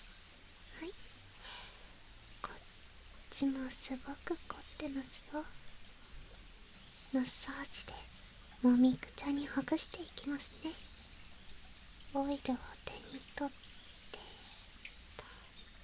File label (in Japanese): 【左】オイルマッサージ, ASMR; エルフメスガキ; 森の癒し耳ほじり